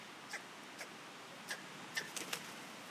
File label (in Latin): Corvus corone; SETE